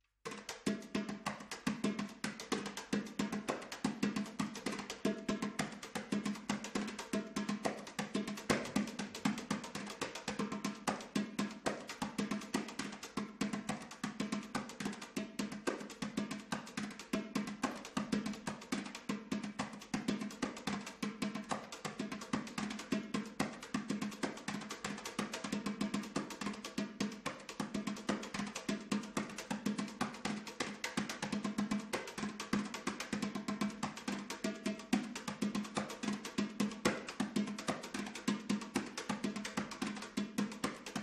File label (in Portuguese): repique de mão